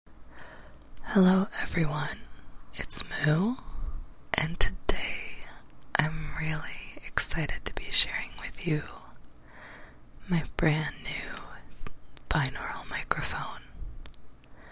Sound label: Ear Eating ASMR NO TALKING Licking, Kissing, Brain Scratching; 3DIO